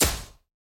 Gun Shot; SoundsCrate

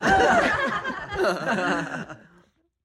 studio audience laugh; soundscrate

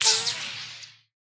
bullet impact ricochet

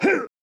man injured grunt; soundscrate

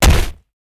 body impact ground

